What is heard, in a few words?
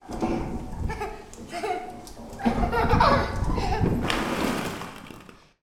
leisure soft play park 3 year old boy down tube slide internal